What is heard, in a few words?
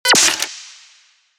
menu decide mechanic; operation